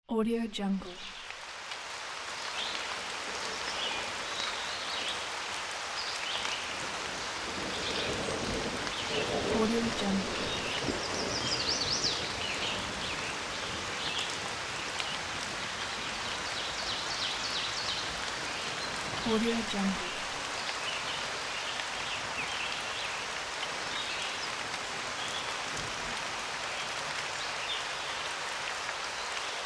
summer rain

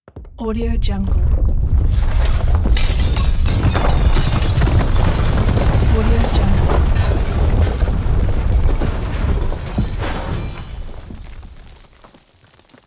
cinematic explosion building

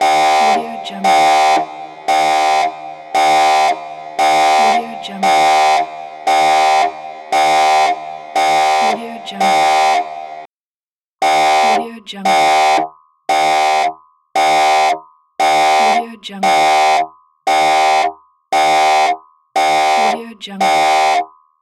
alarm loop

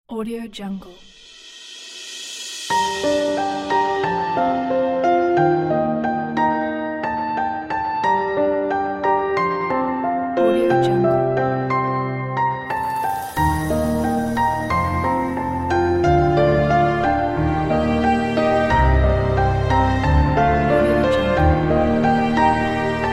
inspiring piano with orchestra

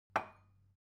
object kitchen glass put down cupboard soft; smartsoundfx

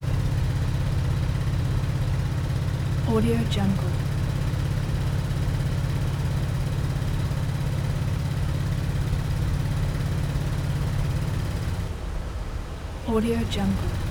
camper constant driving slow to stop; prosoundfx

